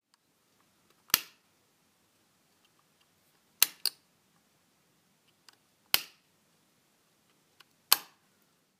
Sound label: Light Switch